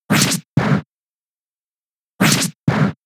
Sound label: Fury Swipes 2 hits; Pokemon; gen 6 attack moves; XY ORAS